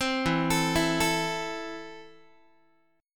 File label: E Suspended 4th Sharp 5th, Guitar, Esus4#5 chord